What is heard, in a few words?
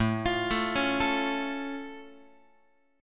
A Augmented A down Guitar; arpeggiated; x,5,8,7,5,8; JGuitar